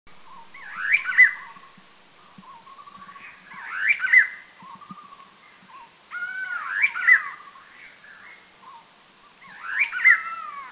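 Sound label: Chestnut-crowned Laughingthrush, Thailand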